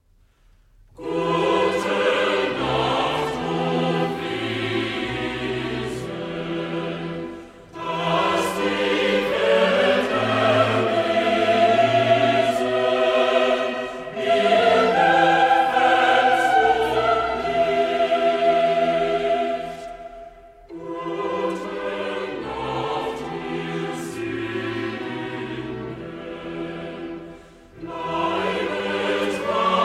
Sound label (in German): Choral; sehet welch eine liebe hat uns der vater erzeiget; bwv 64; gute nacht o wesen